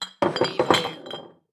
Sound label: Bottles Beer Rattle; sound-effects-bible